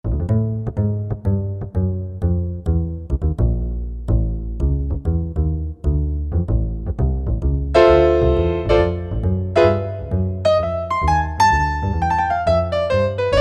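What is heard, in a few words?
Acoustic Bass Piano, Roland U-220; Factory Patches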